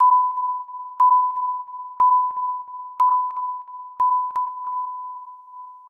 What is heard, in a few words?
medic alert